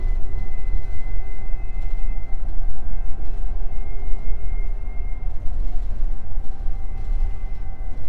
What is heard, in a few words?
electric bus vehicle